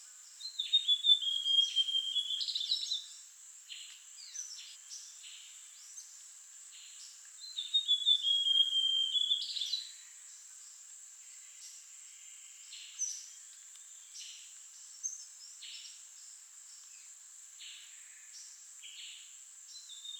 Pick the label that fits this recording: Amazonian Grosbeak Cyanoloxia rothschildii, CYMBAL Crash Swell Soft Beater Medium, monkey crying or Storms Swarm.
Amazonian Grosbeak Cyanoloxia rothschildii